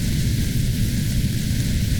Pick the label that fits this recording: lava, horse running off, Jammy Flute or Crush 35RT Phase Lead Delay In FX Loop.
lava